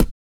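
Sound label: vox percussion, Vocal Planet Jazz FX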